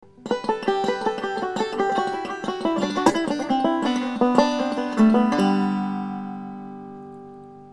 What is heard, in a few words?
scale